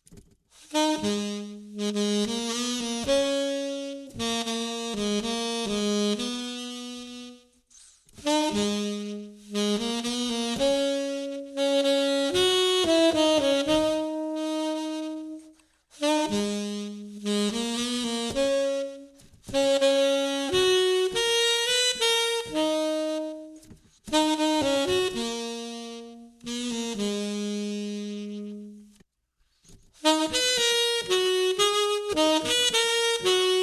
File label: tenor